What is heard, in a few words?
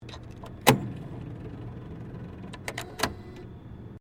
ATM Card Insert, Automated teller machine; Machinery (Sound Effects:Machines)